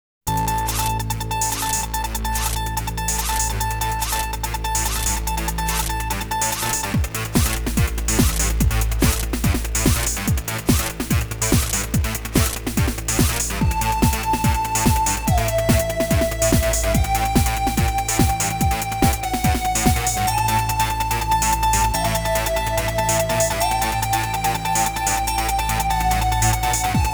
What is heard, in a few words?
Burst Error; eve burst error original soundtrack 1998